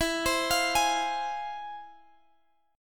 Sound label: F Minor E; arpeggiated